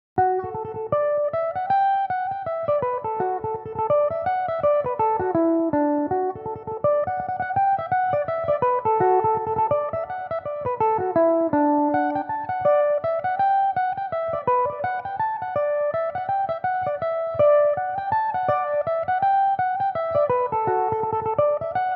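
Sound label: D major belmuse, irish mandolin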